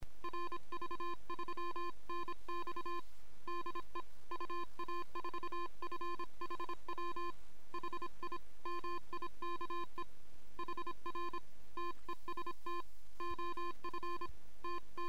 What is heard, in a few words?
tone